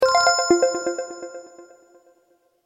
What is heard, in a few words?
Weird Love; Samsung; SCH-U680 Convoy 3